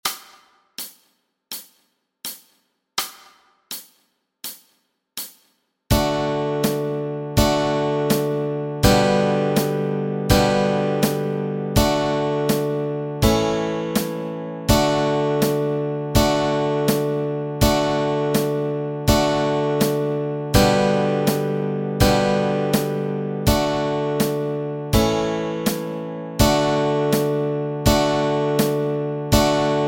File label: How Great Thou Art Chords